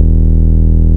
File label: Root E, Bass